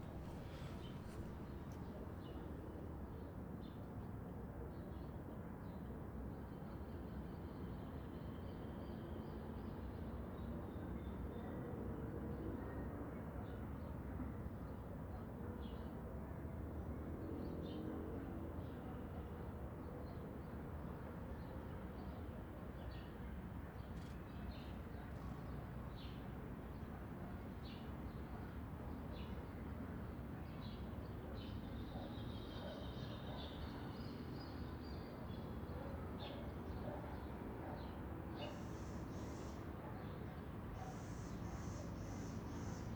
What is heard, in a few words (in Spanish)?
AMB INT MANANA CEMENTERIO; Tacuarembo UY Generico